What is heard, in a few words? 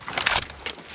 ammo pickup